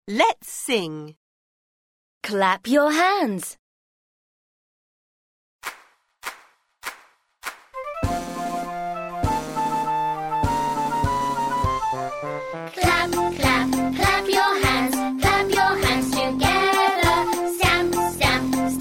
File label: Song Clap